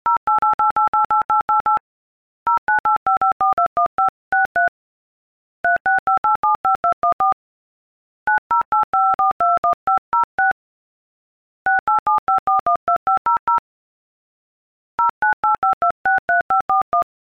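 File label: iphone keyboard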